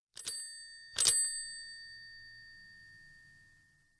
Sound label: Bicycle Bell